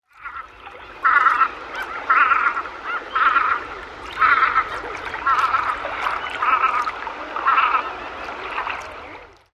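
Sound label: white-winged scoter gaggling calls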